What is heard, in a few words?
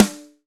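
snare ringer, rim; Roland - Rhythm Section; Rim & Stick; Rim Modules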